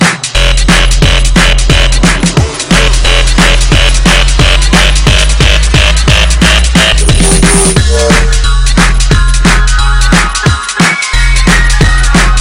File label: DNB